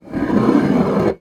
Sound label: foley bottle wine slide across wood table